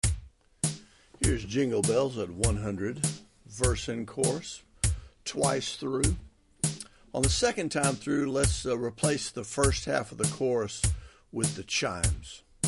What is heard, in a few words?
jingle bells, banjo